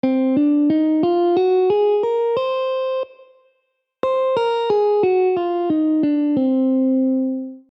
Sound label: Aeolian b5; scales